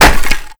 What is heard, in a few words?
bullet impact crate; weapons; Modern Warfare